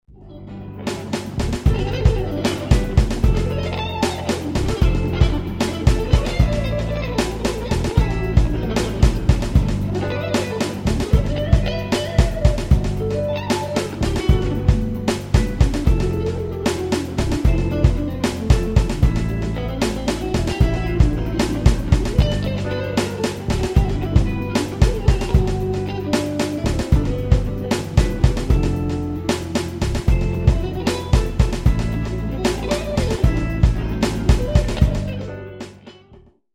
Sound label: jazz